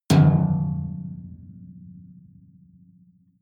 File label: metal impact piano string damped hard